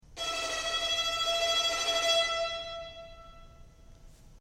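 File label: Violin Trem E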